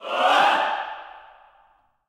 shepard end; serengetikings

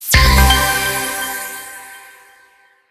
small Win; joker pro

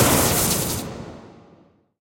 thunder Medium; temple of nudges

